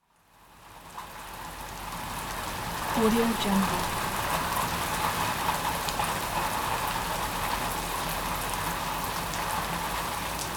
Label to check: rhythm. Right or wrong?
Wrong